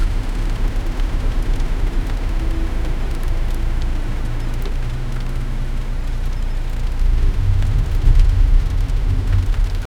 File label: slowly rain and bagraund, drive